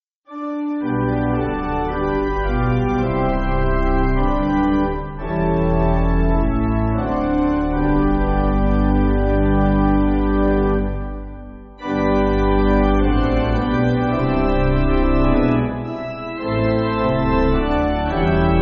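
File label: Pipe Organ, Backing Files for Churches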